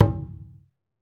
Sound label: Skin Drums; Roland LCDP14 Africa VOL-2; Af.Hand Drm2